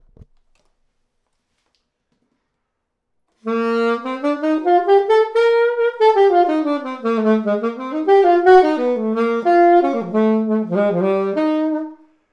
alto

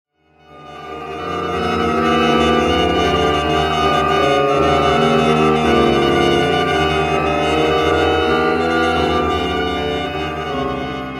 Morse Code; An Exploration of Powerful and Unusual Saxophone Effects

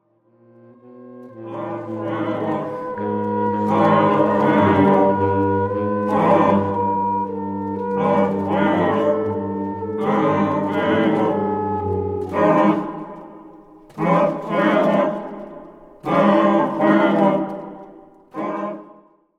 Speech; An Exploration of Powerful and Unusual Saxophone Effects

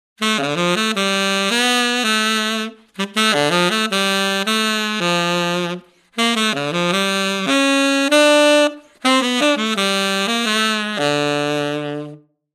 Rolled In, saxophone

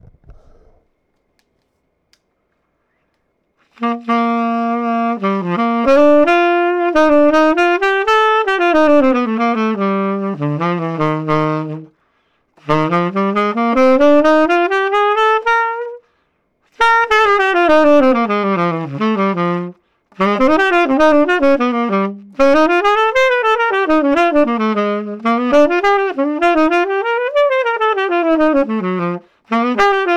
horn

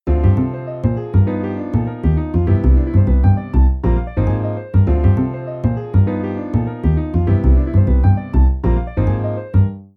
Inversions 1235 ii V7s

